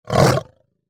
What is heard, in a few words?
cougar effort; Nidalee; Character Voices; English